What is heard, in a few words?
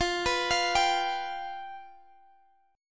F Suspended 2nd Flat 5th